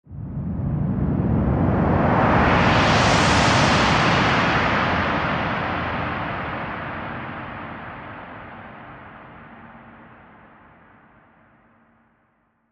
Mountain Breeze Windy Whoosh

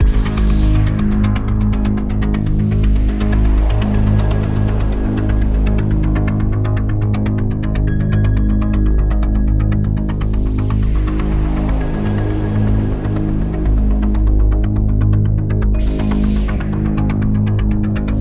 Radio Toolkit Pad

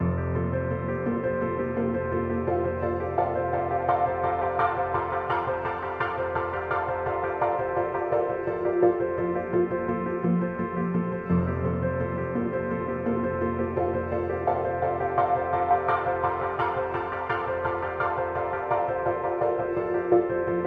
No Percussion

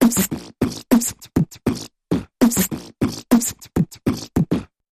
Big Mouth Percussion Variation

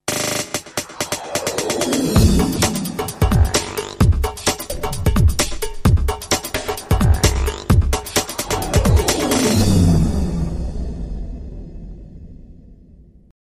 Jazzy Step

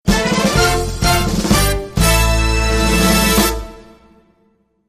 The Kings Fanfare

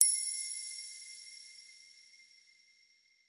Jingle Jingle Chime Hi

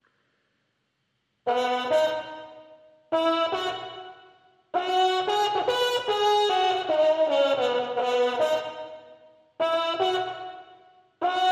Trumpets

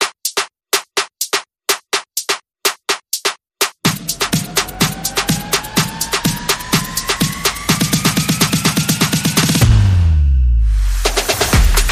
Very Bari, Percussion and Effects

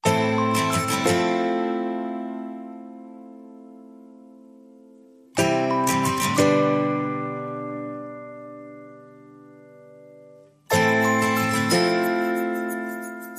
Downbeat

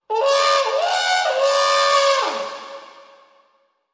Elephant Blues

